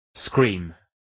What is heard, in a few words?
scream